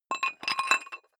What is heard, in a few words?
dumbbell thread put on weight, fitnessstudio